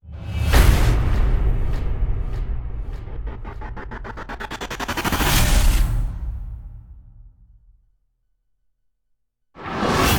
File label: percussion only